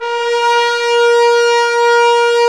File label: BRS, Roland LA Composer; Tbns